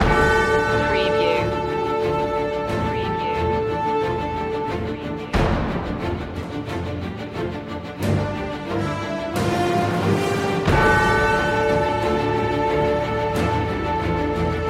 no choir

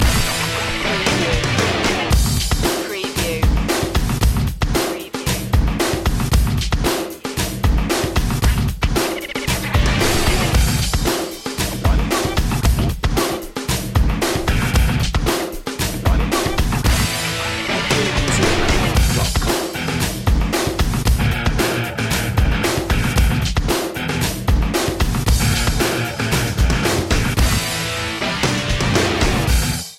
battle beat

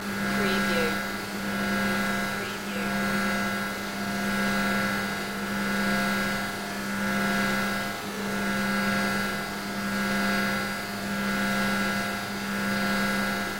sci-fi pulse room ambience loop

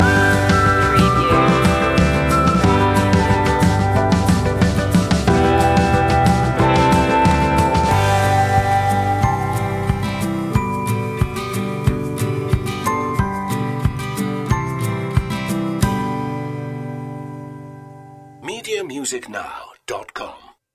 whistle along

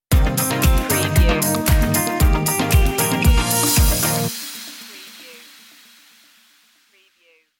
stinger; media music now

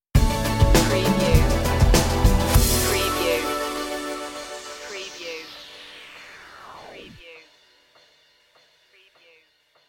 electron stinger

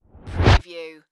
Whoosh; Sound Effects Bible

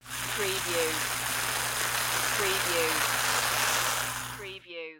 Soda Fizz

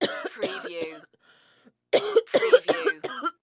Cough Female

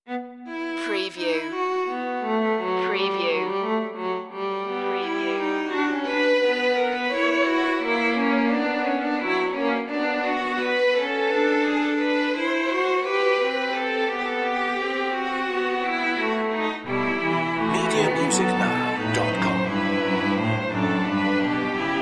fuga e minor

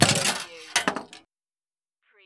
Pinball sound, ball launched, slides and stops

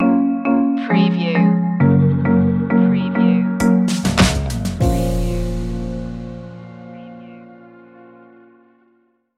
tropical bounce stinger

